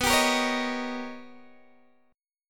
B Augmented Major 9th B Guitar DADGAD

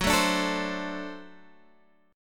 D 7th Gb, guitar

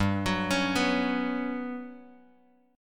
G Major Sharp 11th G, Bass Standard; arpeggiated; JGuitar

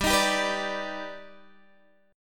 D sharp Augmented Major 7th G, chord

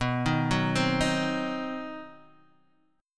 C Diminished B, chord; arpeggiated; JGuitar

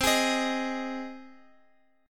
C Minor Sharp 5th C down Guitar Open F; Cm#5 strummed

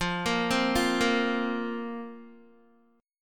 F Suspended 4th F, Guitar; Fsus4 arpeggiated; 12,13,12,10,10,x; JGuitar

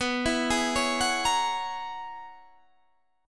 Db Minor 13th B

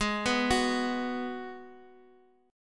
Ab Minor 7th down Guitar DADGAD; Abm7; arpeggiated

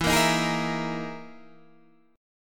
E Major 7th Flat 5th down Guitar DADGAD

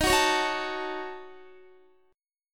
Eb Minor 7th Flat 5th, Guitar; Ebm7b5 strummed

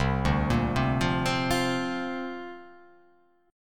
C 7th Suspended 2nd Suspended 4th C down; C7sus2sus4 arpeggiated

